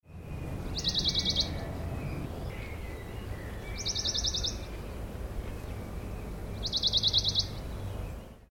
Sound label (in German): berglaubsaenger